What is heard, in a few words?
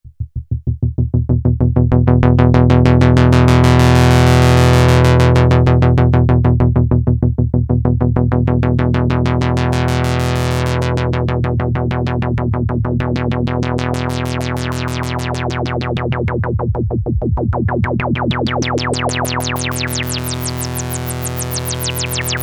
freq res mod; tellun